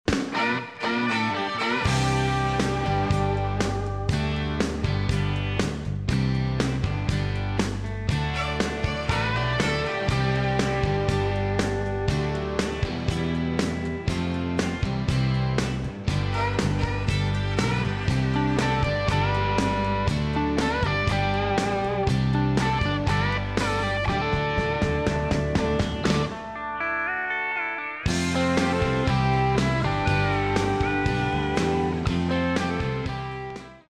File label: country